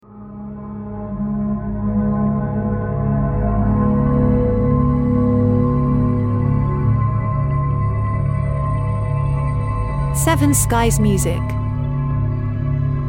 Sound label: Ambient Dark Film Series